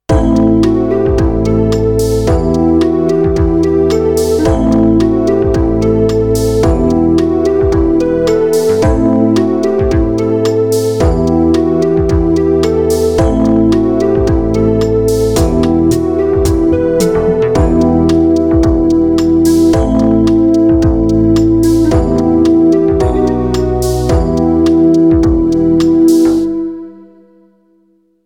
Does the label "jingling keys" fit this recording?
No